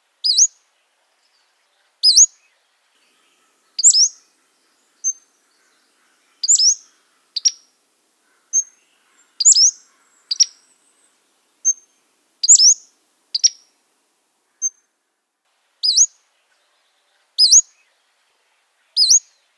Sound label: Pacific slope Flycatcher